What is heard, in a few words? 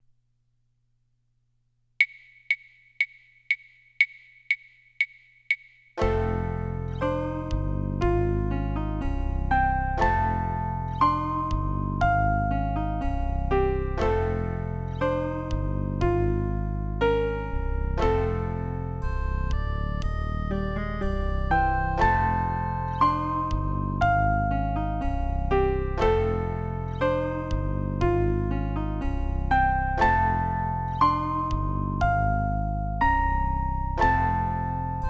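solo with accompaniment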